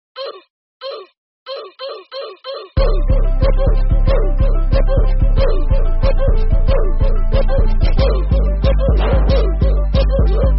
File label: Ooofff; meme sound effect